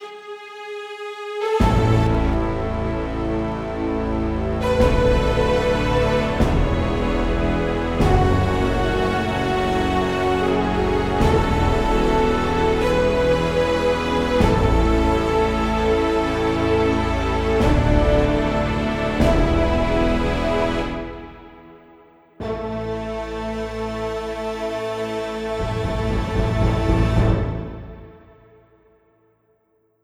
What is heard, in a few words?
cinematic; by burak sound bank; tension gerilim vol 51